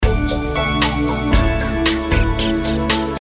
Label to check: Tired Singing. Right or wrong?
Wrong